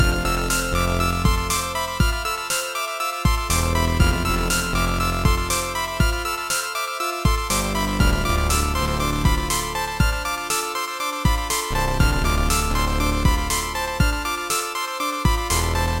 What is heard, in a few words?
Spacebit loop